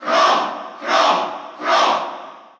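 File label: Cheer Russian, crowd; Chrom; SSBU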